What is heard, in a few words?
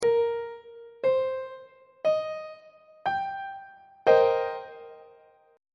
b sharp minor 7th 3rd inversion on treble clef